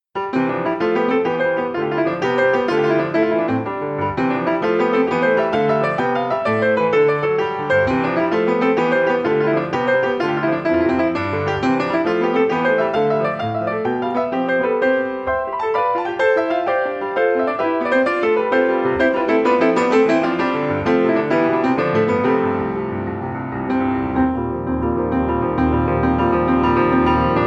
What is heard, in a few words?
Gigue from Suite for Piano